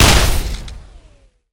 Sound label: shoot; EMXArms; emx kund50